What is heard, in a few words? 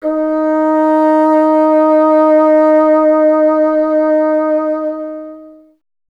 bassoon; Miroslav Vitous - Solo Instruments VOL-1